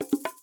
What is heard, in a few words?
cuckoo